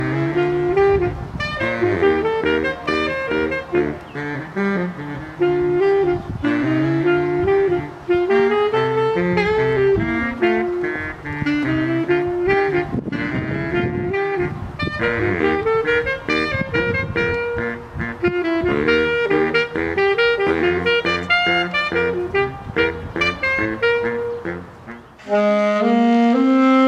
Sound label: Duo Pop composite